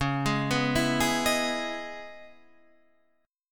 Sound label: Db Minor 9th, chord; arpeggiated; JGuitar